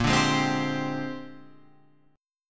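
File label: A sharp 13th chord, Guitar, Half Step Down tuning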